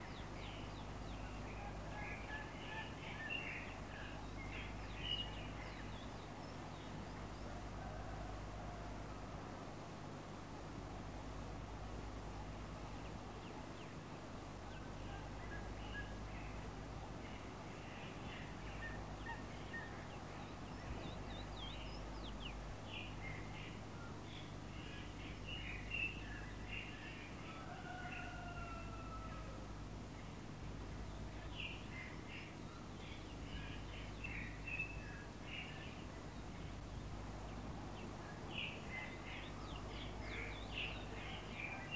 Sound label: field generic